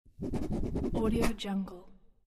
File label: pen signature